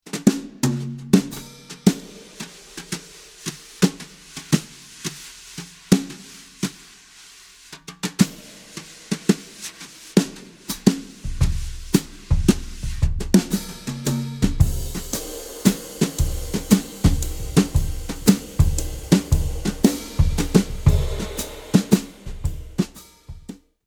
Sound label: brushes, drumtracks